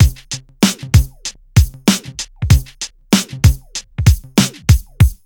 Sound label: BEAT, Hip Hop R n B; USB Soundscan vol.19; AKAI